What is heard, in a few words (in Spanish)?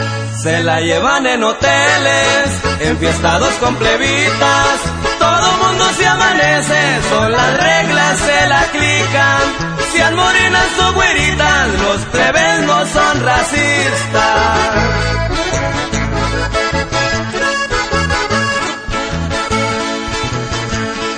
corrido